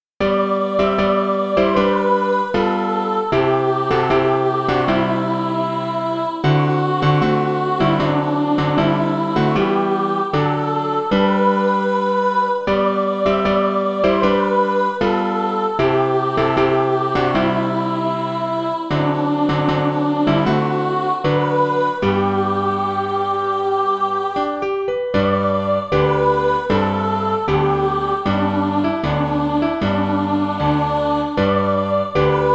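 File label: soprano, Practise Music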